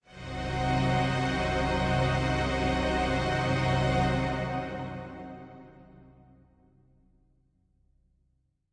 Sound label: Heavy Strings C; PADS and STRINGS